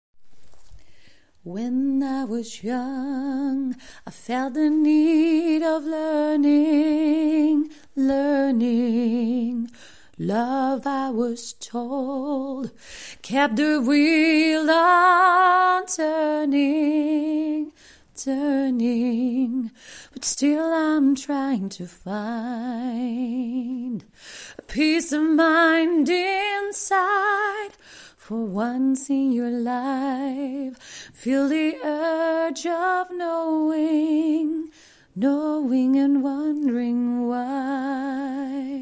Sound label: accapella